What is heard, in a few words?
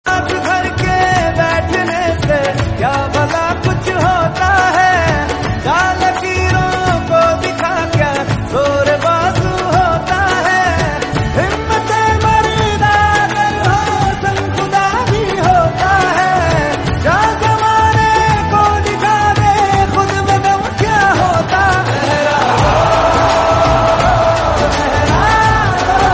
hindi